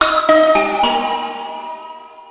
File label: School Pleasant